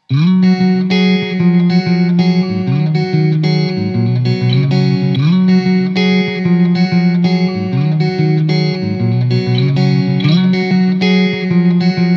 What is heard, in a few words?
finger clean